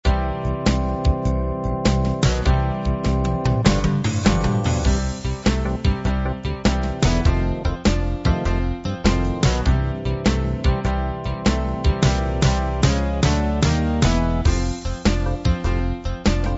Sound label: blues